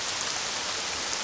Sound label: rain; Ambient; MX vs ATV Unleashed; XBOX